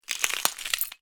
foley footstep broken glass single; zapsplat

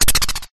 stutter short fade out; sound design; zapsplat

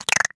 science fiction alien vocalisation clicks; zapsplat

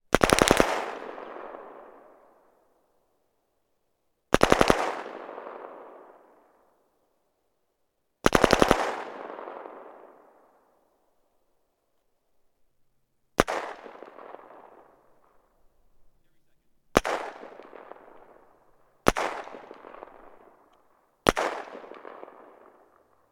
gun ak 47; still north media

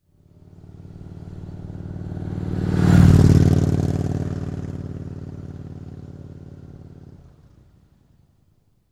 Motorcycle Pass By; Blastwave FX

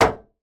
impact vehicle van panel; sound effects one

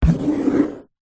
office chalk draw lines hard blackboard scrape

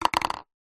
household cup plastic empty knock over table; zapsplat

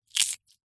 animals insect cockroach crush squash crunch

